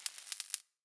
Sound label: light gas spark; Escape from Tarkov